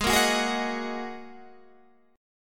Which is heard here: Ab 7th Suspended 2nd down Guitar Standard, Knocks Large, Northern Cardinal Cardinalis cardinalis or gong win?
Ab 7th Suspended 2nd down Guitar Standard